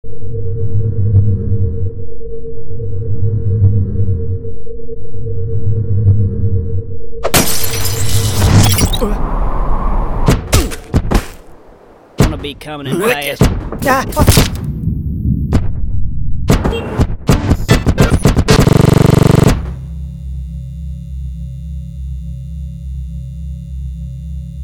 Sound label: Teleporter